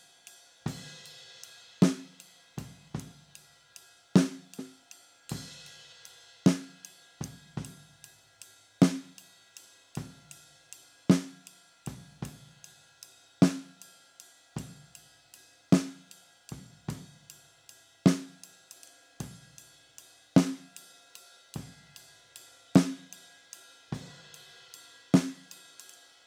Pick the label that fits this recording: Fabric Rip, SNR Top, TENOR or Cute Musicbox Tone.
SNR Top